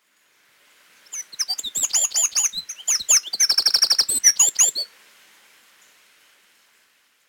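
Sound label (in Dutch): Winterkoning Troglodytes troglodytes, fluiten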